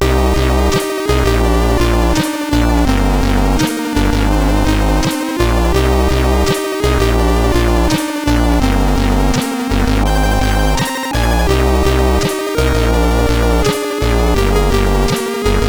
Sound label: Thunder Intro, CSG8580R5